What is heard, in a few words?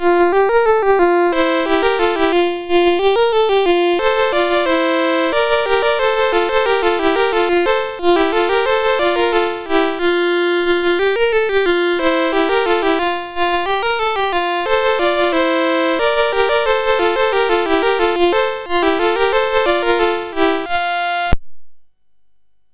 Sea Chanty, MOS6581; MOS6581R2